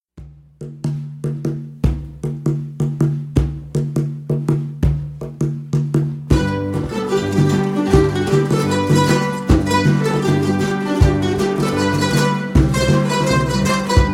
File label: Hawaiian War Chant, ukes